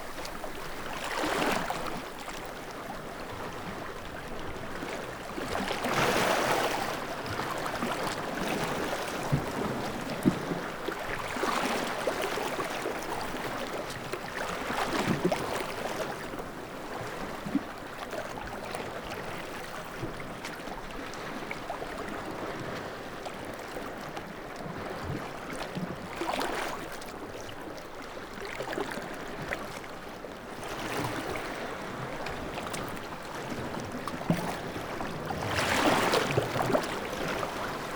waves

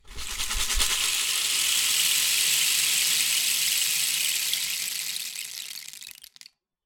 Rain Stick, Percussion Samples

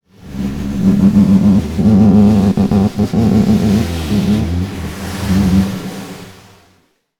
Snare Drum; Percussion Samples

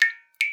Drips

